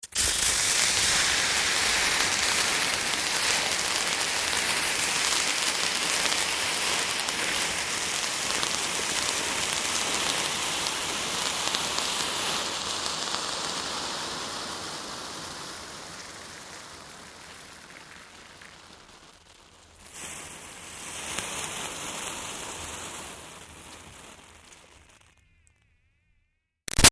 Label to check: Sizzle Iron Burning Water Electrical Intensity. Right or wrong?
Right